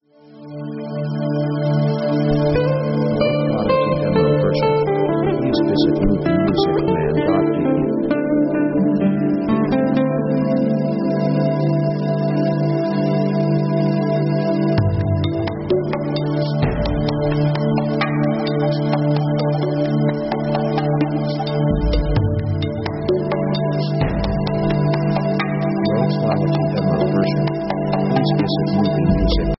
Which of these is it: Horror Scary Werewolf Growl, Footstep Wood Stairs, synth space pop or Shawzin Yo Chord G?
synth space pop